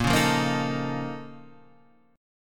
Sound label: A sharp Minor Major 11th, A sharp, down